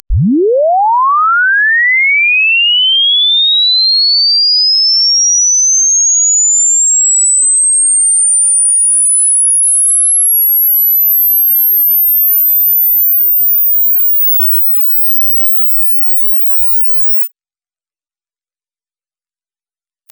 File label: bluetooth aac sweep